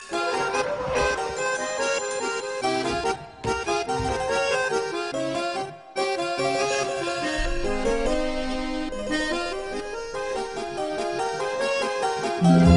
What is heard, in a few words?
Bgm; Barfi